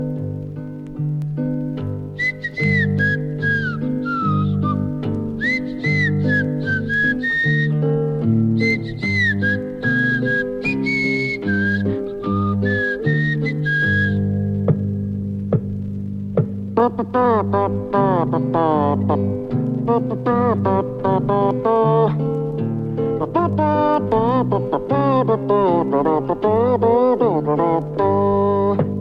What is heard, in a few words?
whistle; robin hood